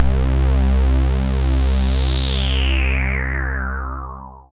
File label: SYN HOUSE, Dance Synth; Sound & Vision - Gigapack I CD 2 (Roland)